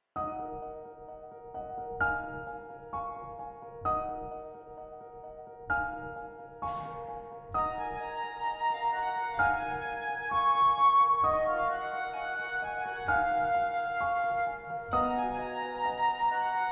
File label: Concert Flute